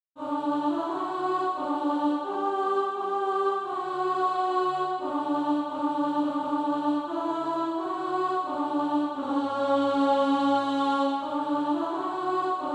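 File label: alto, Sheet music (SATB) including Practise Music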